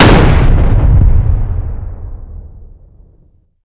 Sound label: explosion close int; gmod; arccw uc commons attachments